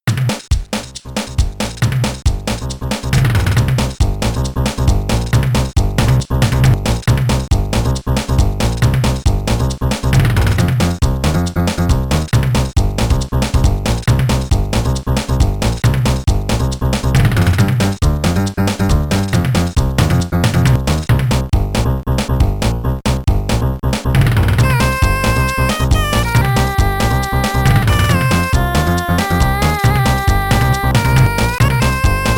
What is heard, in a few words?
lava tune; DKP2001